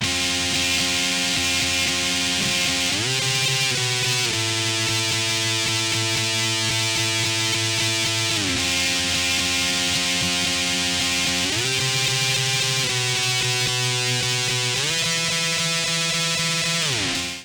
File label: elec gtr rhythm; rhythm 2